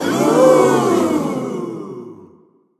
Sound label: oooooh; running man; genki